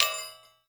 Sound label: Marble Stn Hit